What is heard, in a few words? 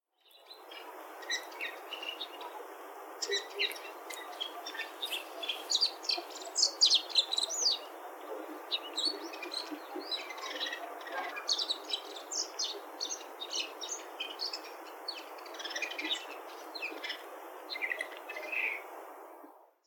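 mozu, bull-headed shrike; saitama; sagiyama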